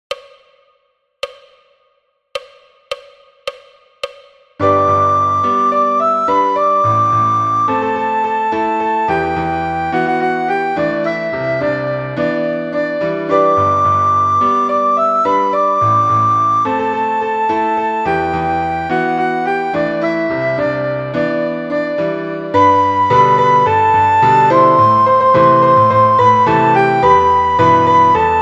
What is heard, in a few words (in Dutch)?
sopraan